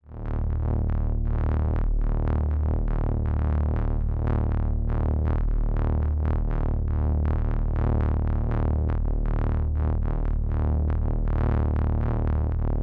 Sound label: Triple Ring Bass Drone; dreadbox Erebus V3